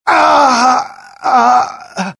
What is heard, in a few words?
death, pain; mohaa; dialogue